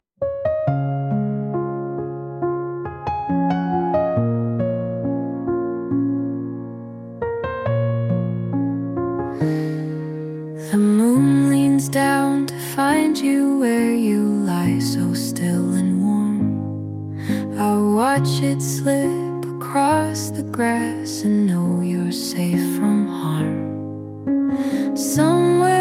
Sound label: lullaby, name songs; heidi